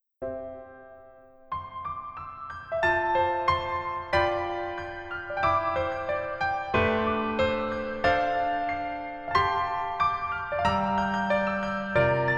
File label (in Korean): Piano ver, 멜로디MR, 여자키; 더킹, 영원의 군주